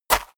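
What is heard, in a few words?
step walk dirt, footsteps; schritte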